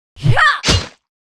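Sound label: Mob attack; 묘족; 가가공주; 2단계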